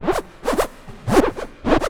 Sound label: tech fx; Best Service; Hyper Dance; EFFECTS